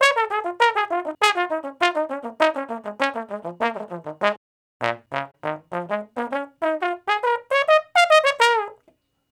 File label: Trombone Straight (Bb); Sonic Foundry (Sony Creative Software); Horncraft for R&B; Solos